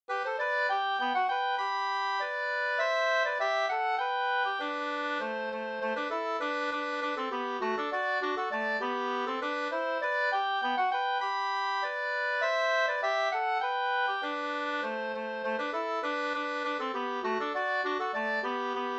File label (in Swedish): polka polkett; fr Stugun Jm 2-2 2st